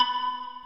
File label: Arp; osu